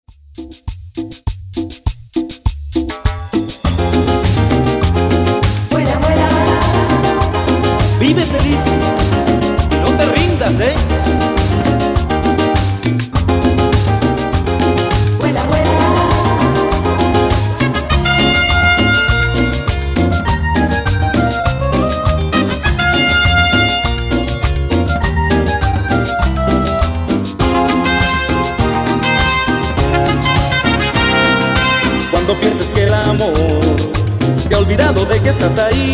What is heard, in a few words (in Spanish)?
Extended Cumbia Perc